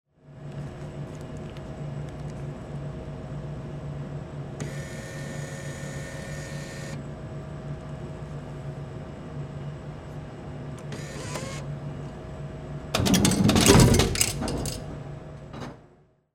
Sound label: softdrink vending machine